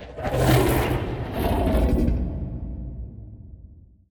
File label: void worms mature attack; Stellaris; grand archive; ships